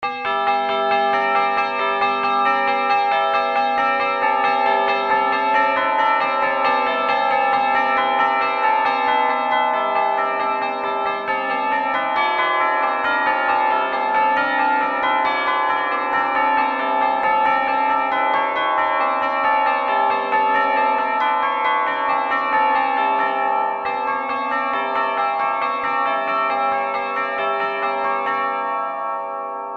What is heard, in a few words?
bells 70 disjunct tones; bell tuning compare